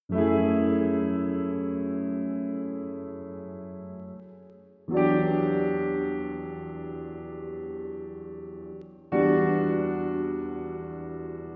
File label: Piano remember of past